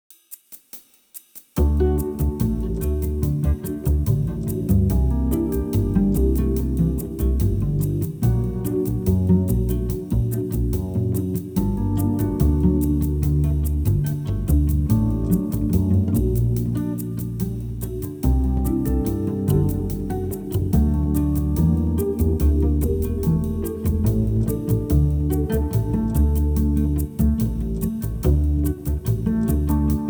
Mode Of Melodic Minor Progression

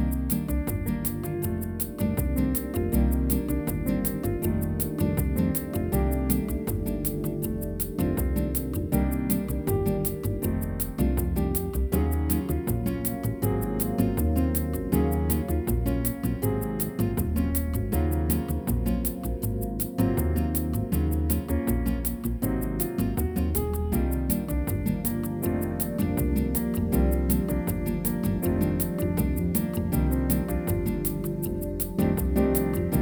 C-Eb Dorian

